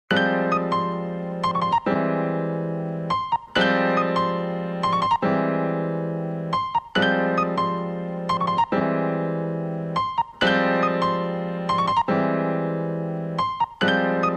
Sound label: Instrumental